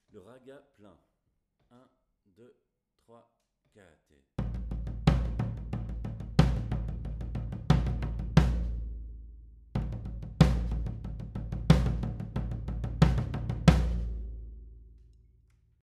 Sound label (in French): Ragga Plein Bass, Batucada